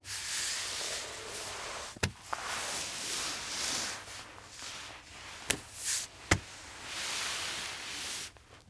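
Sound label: crawl steps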